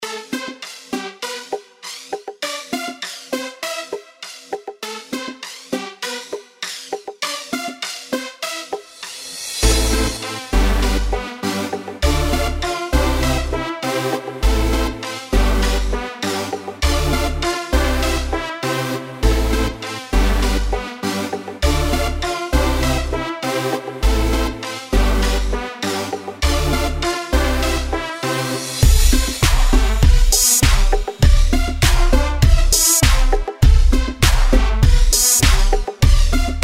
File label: electro